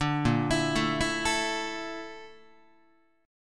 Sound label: A sharp Major Sharp 11th, Guitar; A#M#11; arpeggiated; JGuitar